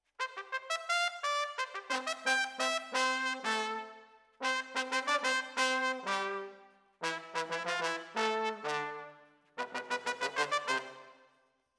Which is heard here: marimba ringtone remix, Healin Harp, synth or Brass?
Brass